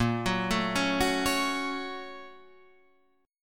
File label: Bb Minor Major 7th Sharp 5th, Guitar, Standard; BbmM7#5; arpeggiated; 6,9,7,6,7,9; JGuitar